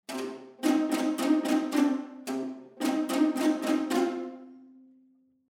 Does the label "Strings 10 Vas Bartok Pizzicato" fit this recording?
Yes